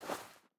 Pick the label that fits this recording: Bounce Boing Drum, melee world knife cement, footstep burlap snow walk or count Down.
footstep burlap snow walk